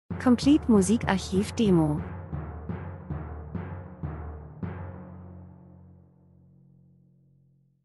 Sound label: drums; Timpani